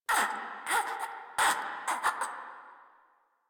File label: Infested Crawler Breath; Warframe